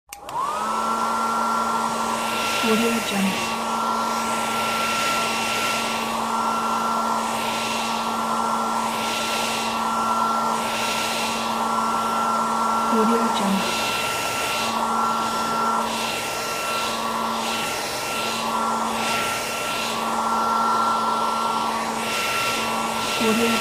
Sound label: hair dryer